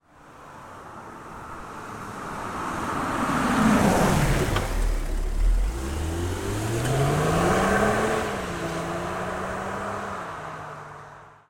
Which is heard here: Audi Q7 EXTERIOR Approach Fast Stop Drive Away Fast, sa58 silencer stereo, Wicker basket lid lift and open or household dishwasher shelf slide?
Audi Q7 EXTERIOR Approach Fast Stop Drive Away Fast